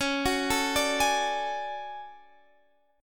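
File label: D Major Sharp 11th D flat, chord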